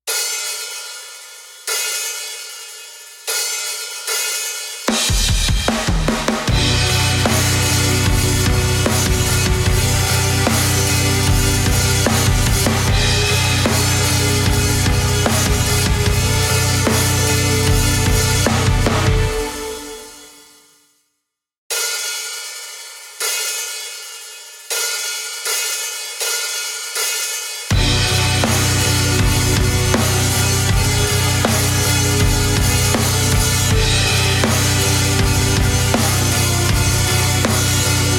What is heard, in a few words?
karaoke